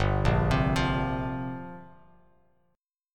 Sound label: G Minor 11th, Bass Standard; Gm11 arpeggiated; JGuitar